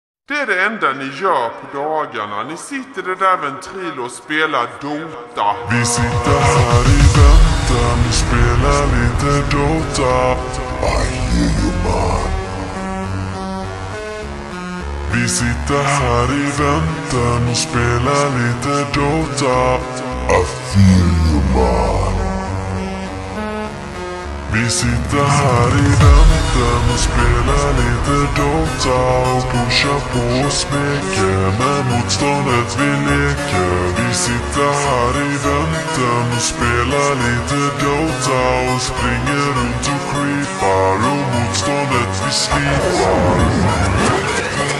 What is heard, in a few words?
slowed reverb